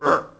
piglette collision

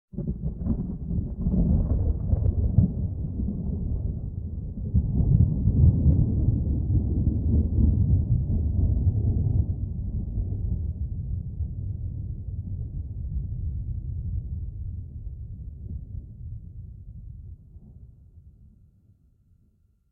thunder

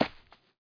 ball landing rough

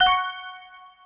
gate

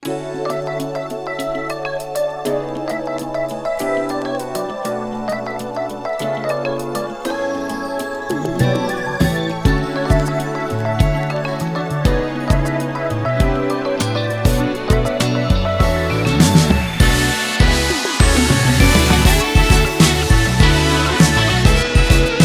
Instrumental

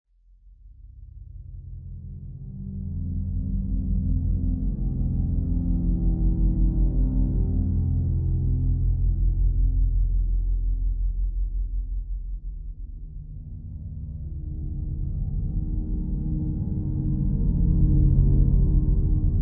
Dark Stairwell; OneShot Fading Memory

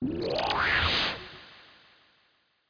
course zoom in

